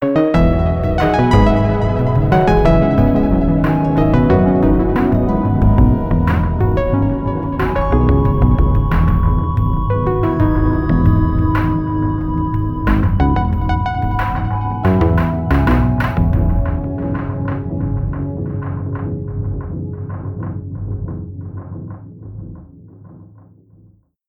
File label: Jump; Laterous